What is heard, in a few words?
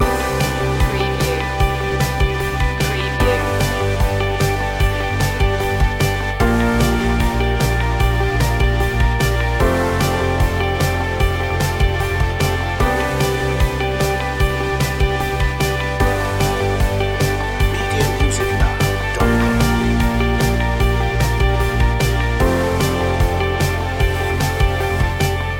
a clear mind loop; Corporate / Motivational